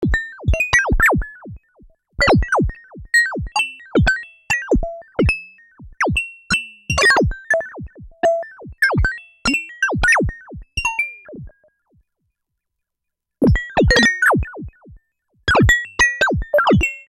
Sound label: Buchla 200 FM; buchla modular